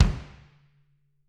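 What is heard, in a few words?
bass drum; Bob Clearmountain Drums I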